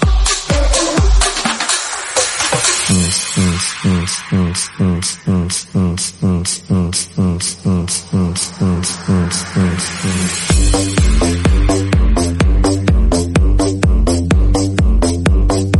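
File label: MashUp, 126 bpm, G minor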